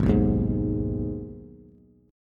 Ab Minor Ab down Bass; Abm strummed; JGuitar

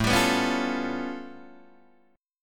A Augmented 9th A Guitar Open D

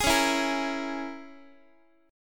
Db Minor 6th Db down Guitar; Dbm6 strummed; x,x,11,11,8,9; JGuitar

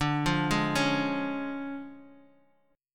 D Minor Major 7th Flat 5th D

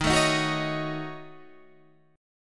E flat Minor 7th Flat 5th; strummed; 11,12,13,11,14,11

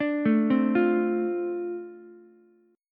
D-Minor 7th, D, Ukulele; 7,9,8,8; Dm7 arpeggiated

